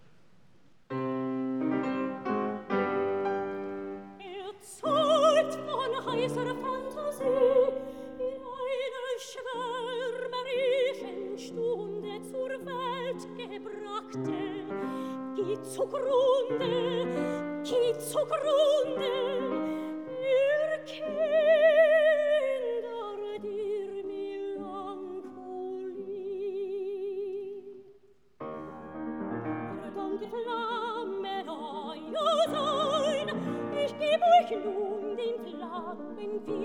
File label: song for voice & piano; Als Luise die Briefe ("Erzeugt von heisser Phantasie"); K. 520